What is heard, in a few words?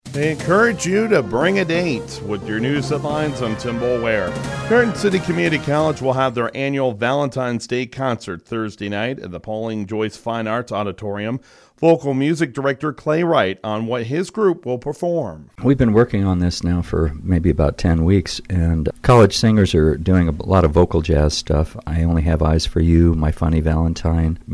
choir concert